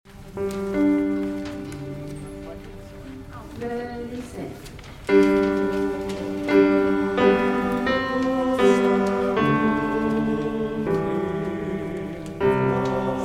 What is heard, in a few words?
bass and tenor